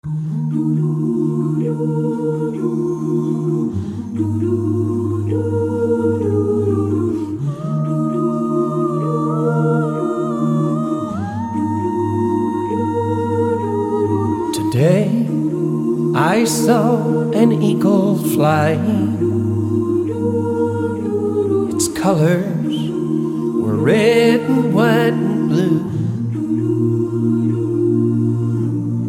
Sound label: with Voices